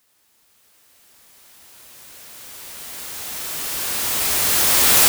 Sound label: 140 FX LOOPS; Loopmasters; The New Sound Of Dubstep